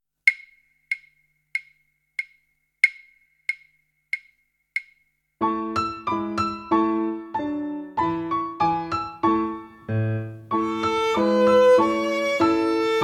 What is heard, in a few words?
Bell ringers